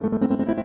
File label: grand keys ride; miami vice